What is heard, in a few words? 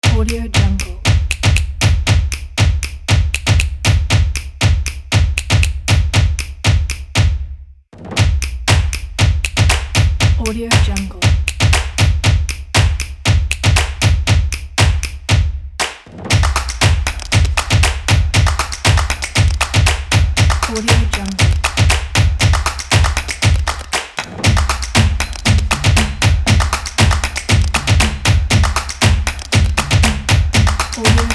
percussion; blueeagleaudio